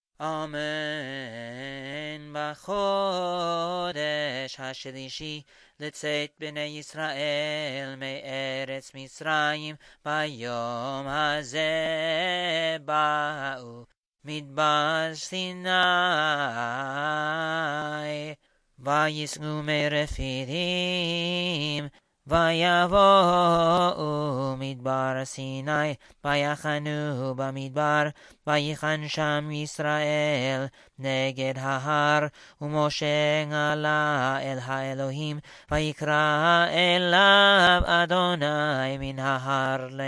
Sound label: Yithro Fourth Aliyah D Minor